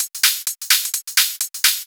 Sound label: Hihat Loop 128 BPM